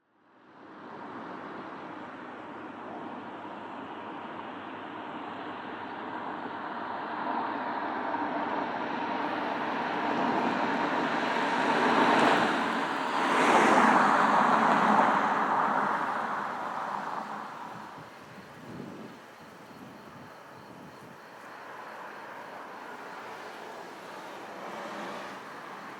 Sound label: Cars and birds; Salas Birds